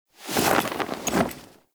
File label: Camp Canopy Flap; Quests; Veilbreaker; Kahl Camp